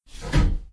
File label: door; minetest game; marsdoors